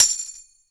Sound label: TAM, NYC Percussionworks; Sampleheads; 90 s Sample CDs